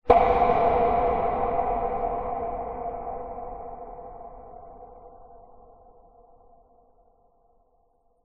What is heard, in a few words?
sound design knock bang cinematic big reverb horror